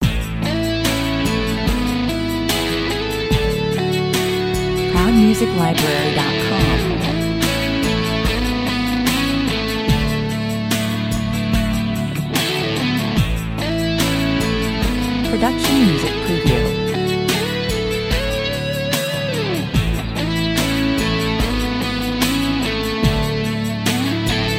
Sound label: solo 73 bpm